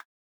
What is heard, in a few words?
click; blocks up